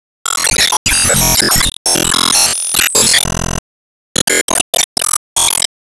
Se Sys League Rankup

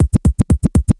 Transition Vinyl 120bpm; Music Tunnel Section; Subway Surfers City; Android, iOS

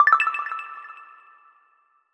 Goal

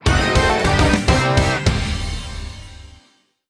Music event; Power Pro Kun Pocket R

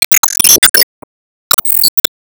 Emo Warcry; Disney Star Smash; Android, iOS, Mobile

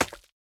Slime Impact Small

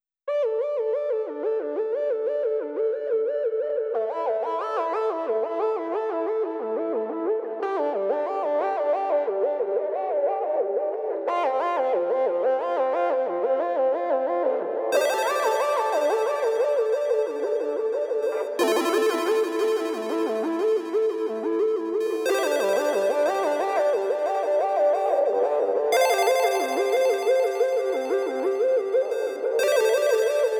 Cherrapunji Synths 90

